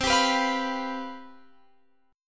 C Major 7th Suspended 4th, down, Guitar, Open F; CM7sus4 strummed

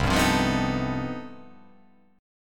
C Minor Major 13th, chord

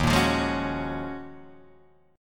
Eb Minor 11th Eb down Guitar Drop D; Ebm11 strummed; JGuitar

